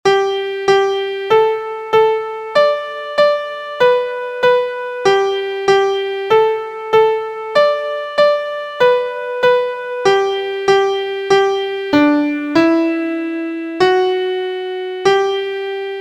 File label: MIDI